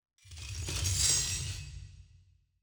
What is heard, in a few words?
designer scifi mechanical movement; SoundsCrate